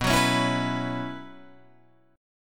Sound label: B Suspended 2nd Suspended 4th, Guitar, Open G